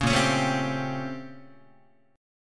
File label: Bb Minor Major 13th Bb, Guitar; BbmM13; strummed; JGuitar